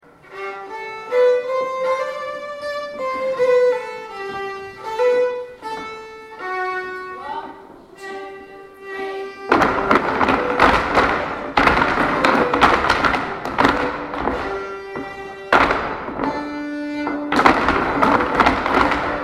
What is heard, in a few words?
Clog dance; Queen Victorias Shawl